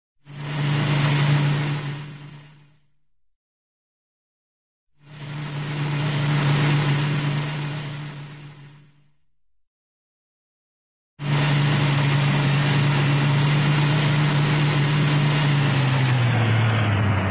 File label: auto, cartoon mystery van pass by; scooby